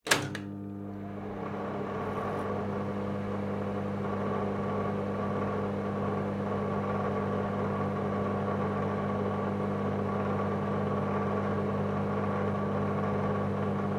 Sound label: microwave oven, household sounds